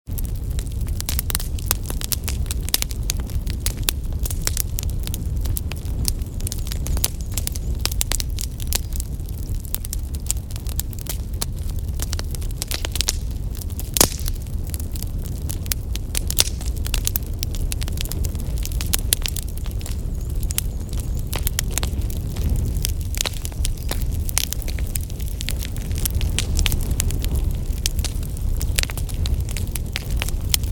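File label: Daytime Forest Bonfire sound effect; YTStudio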